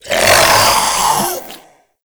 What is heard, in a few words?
Infested Shoulder Fire Start, Enemies; Warframe; Femur